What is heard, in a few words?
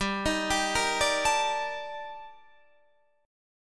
Eb Major Sharp 11th G